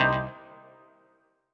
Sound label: HOUSE, House Dance